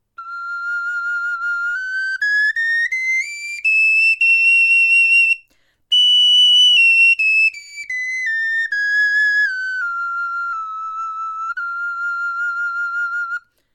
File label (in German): tonleiter, knochenfloete